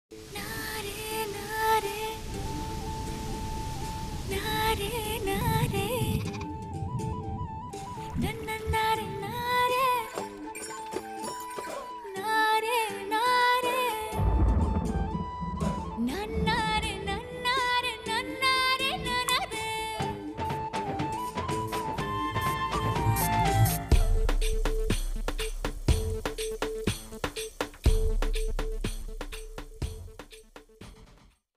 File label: song bgm